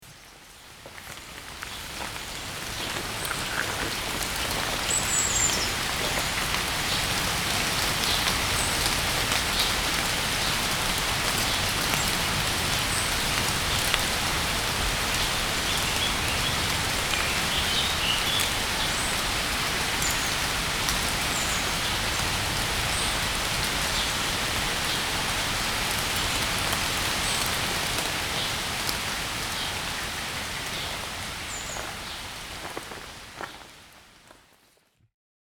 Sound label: Quiet Morning Hike in Roncesvalles